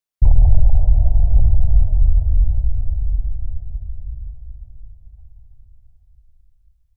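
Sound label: Explo Scifi Or Underwater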